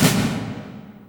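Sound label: DEATH, AMBIENT DRUMS; Spectrasonic Distorted Reality 1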